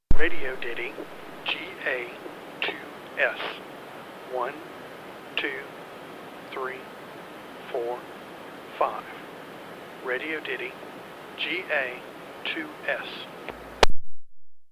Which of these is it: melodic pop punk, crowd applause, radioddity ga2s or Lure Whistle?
radioddity ga2s